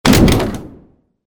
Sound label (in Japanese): 宝箱を開ける モノラル